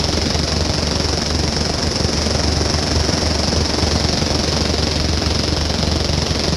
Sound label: helicopter; Transport